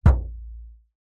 foley plastic thin sheet under tension knock impact; zapsplat